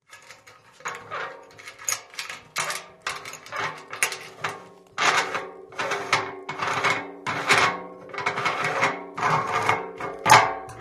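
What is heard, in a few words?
sounds of canister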